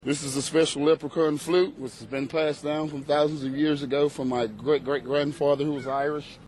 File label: its a special flute